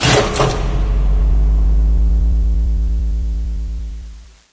lights on; XDM; buttons

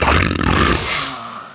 zombie idle; XDM

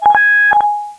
click; hl1mp; doomify

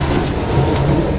push wood, debris; XDM

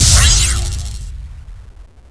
teleporter blast; XDM

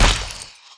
push flesh; XDM

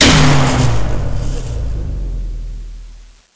light power off; XDM; buttons

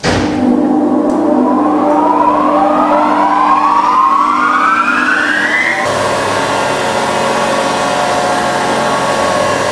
30kW 50Hz motor; XDM; ambience

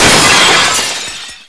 bust glass, debris; XDM

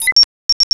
satchel detonate, weapons; XDM

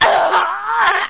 death; hl1mp; XDM; hassassin

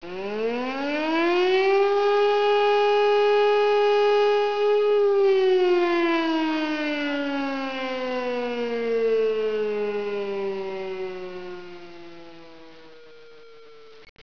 AIR RAID; hl1mp; damncross

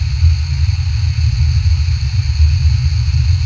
gas, ambience; XDM

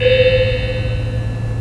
big warning; XDM; ambience

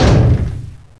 door stop; XDM

